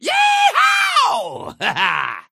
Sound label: yee haw